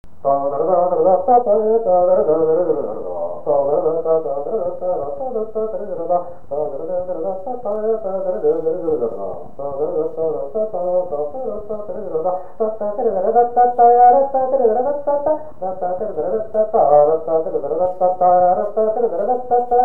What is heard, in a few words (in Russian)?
Bashkirskij kubyz Naigrysh